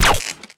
Rabbid Electrocute; Rabbids Coding

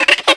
bug; bug catcher

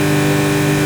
Engine loop; blastmorph pinball; xbox series xs

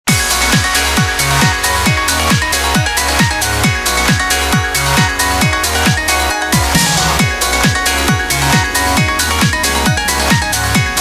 Loop

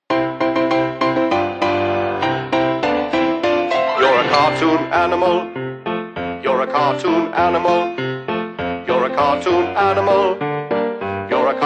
Song Cartoon animal Vocal; baron fur is gonna fly; switch windows xbox one

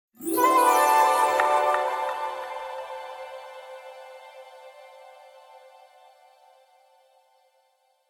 Jingle unlock upgrade; ps4; xbox series xs

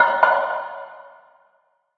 Wood Spoon Pot

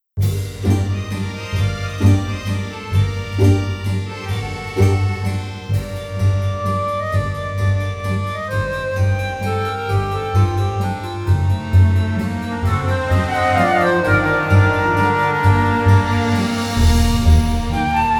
Medium French Port; pirates of the burning sea game soundtrack 2007

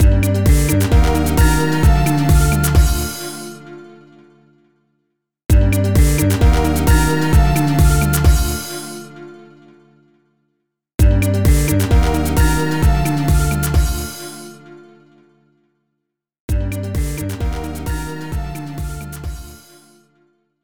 Minigame Failure; Gremlins Gizmo; wii

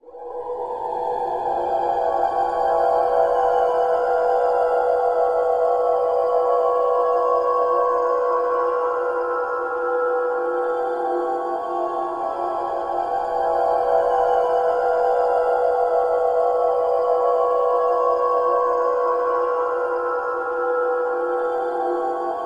Ominous Fog

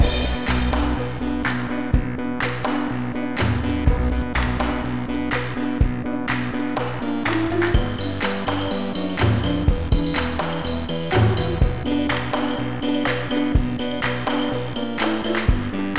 Puzzler; Imagicard Teenage Mutant Ninja Turtles; LeapPad Explorer gamerip 2014

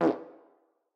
Prisoner Poo Fart

